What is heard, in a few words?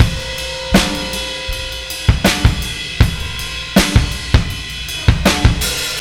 80 bpm, breaks; Zero-G Beneath the Planet of the Breaks